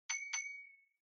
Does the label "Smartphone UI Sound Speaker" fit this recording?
Yes